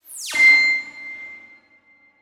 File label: Transition Laser Horn; Adobe; Production Element